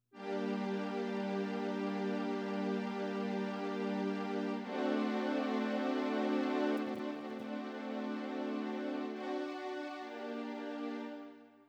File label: synth